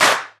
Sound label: Clap; 7rystan's 600+ FREE EDM SAMPLES & DRUMS; Percussion